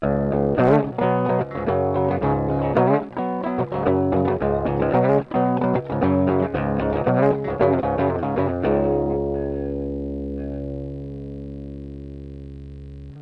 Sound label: clean; tonestack bigmuff